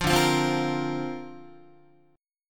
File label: D-Minor 7th Sharp 5th D Guitar, chord; Dm7#5; strummed